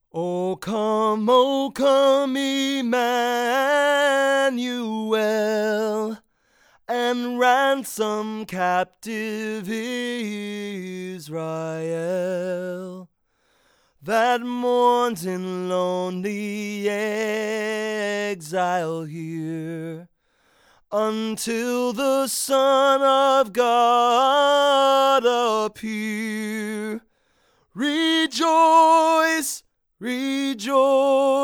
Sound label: male vocal sample